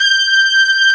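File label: Orchestra Brass, Roland; Club-50 - Foundations Roland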